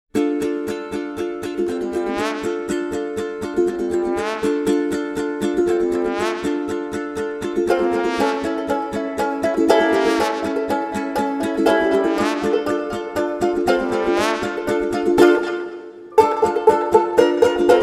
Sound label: Ukulele and Trombone